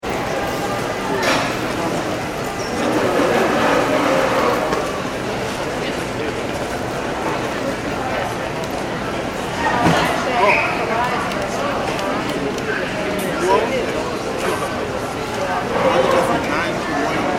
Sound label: ambience airport departure lounge; Airport Ambience - Departure Lounge - John F . Kennedy ( Jfk ) Airport; Backgrounds; Airports; McKinneySound